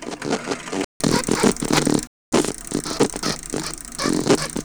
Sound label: funny machine fx; Cartoon - film effects